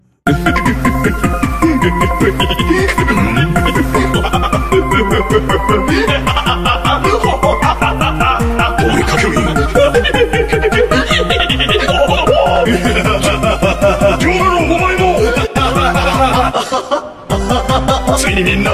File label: laugh remix